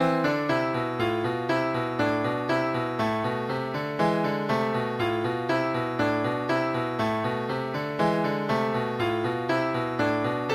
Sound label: bourree